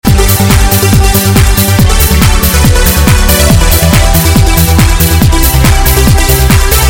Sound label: Loop